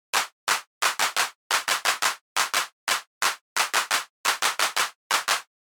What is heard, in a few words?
clap; alchemy